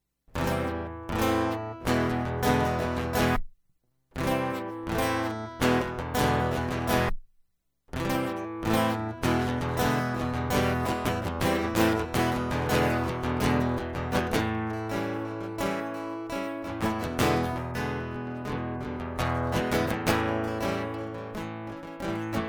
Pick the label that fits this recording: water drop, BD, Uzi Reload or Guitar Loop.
Guitar Loop